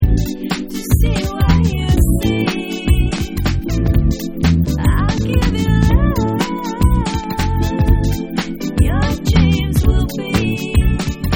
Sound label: Brass Desert Mix